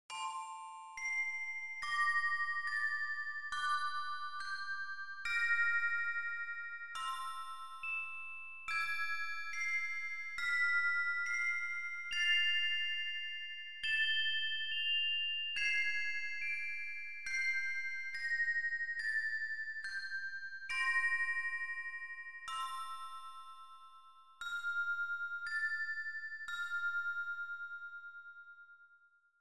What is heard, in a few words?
count tempo70